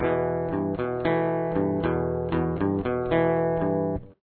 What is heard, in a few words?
intro, guitar